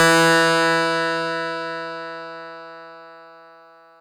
Fuzz Clav DX100 E3

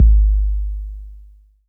BD 808 Tape Decay

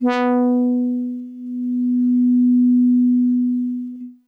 Soft Horns DX100 B3

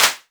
Clap; EFM

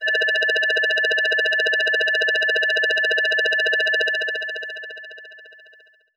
Helicopter FX DX100 Cs6

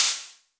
Hihat O

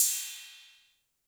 Cym A 808 Tape Decay A; Cymbal; Clean

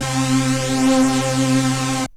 Juno106 Noise Chorus Vinyl Synths C2; vinyl leads

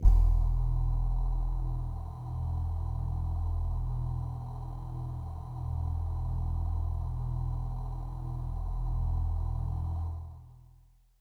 Bell Air Kawaii E2

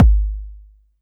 BD

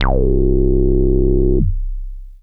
BASS

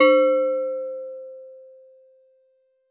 Steel Drums DX100 C4

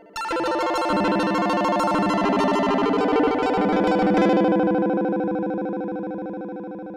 ARPEGGIO Arpz Hi Low; Chanchin

